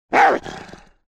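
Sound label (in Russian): одно злобное тявканье и бросок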